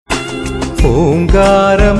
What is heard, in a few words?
Om voice